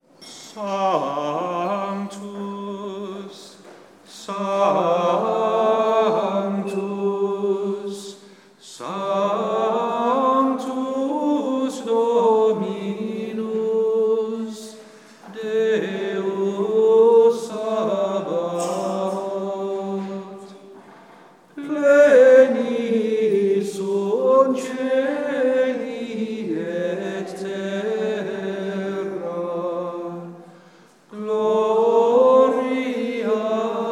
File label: sanctus no organ